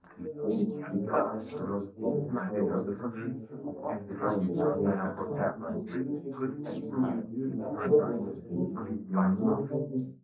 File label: male, 380ms 4cm; SiSEC11; underdetermined